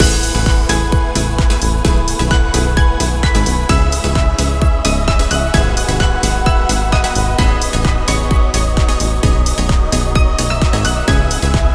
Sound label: BGM RESULT; KALEID